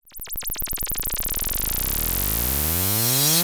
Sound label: Rise FX